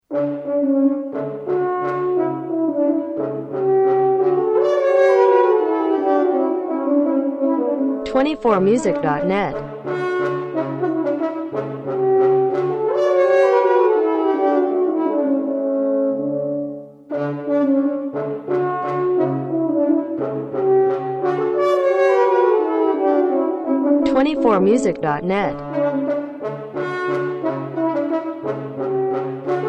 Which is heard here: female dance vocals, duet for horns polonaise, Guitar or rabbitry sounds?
duet for horns polonaise